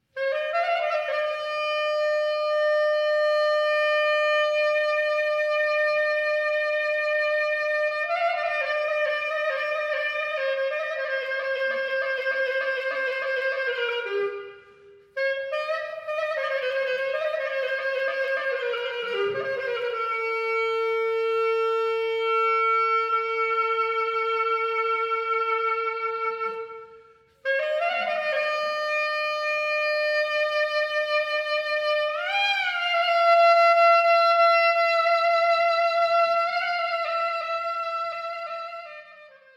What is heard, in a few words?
clarinet solo; na trapeza